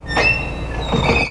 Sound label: Door Metallic Squea, push bar